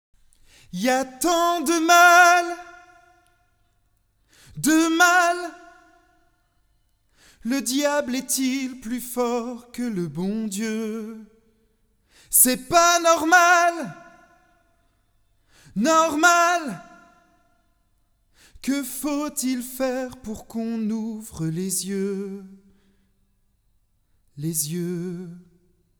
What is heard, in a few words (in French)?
no man's land voix; mixage titres enregistres